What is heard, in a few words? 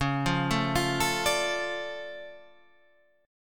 D Minor Db, Dm/Db chord, guitar; Dm/Db arpeggiated; JGuitar